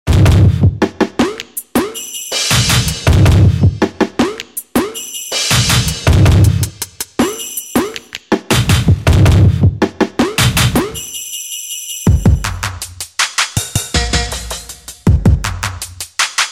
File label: Beat Suite; Instrumental